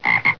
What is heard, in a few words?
croak, frog; ambient